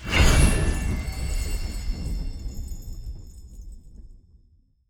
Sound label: linked Reels Start; netent